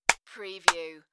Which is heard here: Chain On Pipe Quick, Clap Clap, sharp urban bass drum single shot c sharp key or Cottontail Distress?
Clap Clap